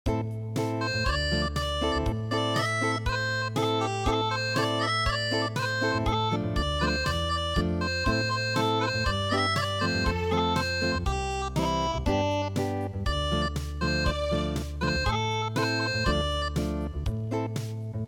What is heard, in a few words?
Acoustic